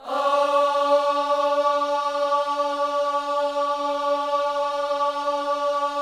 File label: OHS D 4C, gospel vocal; Spectrasonics; Vocal Planet Vol.1 Gospel; akai